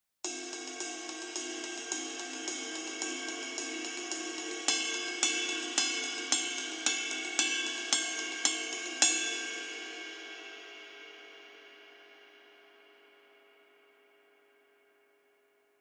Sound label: Resonant Ride 22